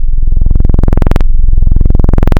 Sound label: bw saw rounded wavetable 2048; Adventure Kid